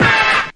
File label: whap